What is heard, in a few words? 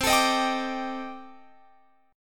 C Minor 6th Add 9th, C, down, Guitar, Open F; Cm6add9 strummed